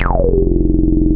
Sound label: SH101; OLD ROLNDS